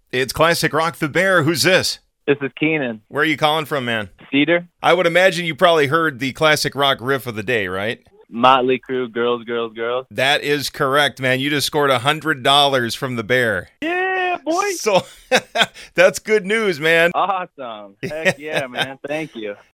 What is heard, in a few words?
Riff